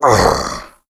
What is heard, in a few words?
die